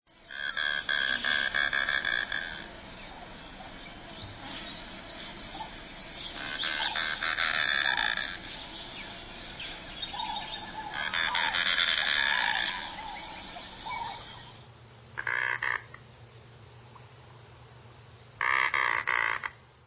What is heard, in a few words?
Anhinga